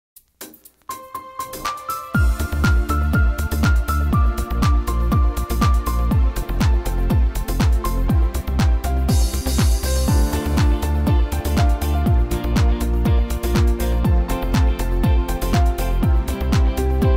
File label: instrumental cover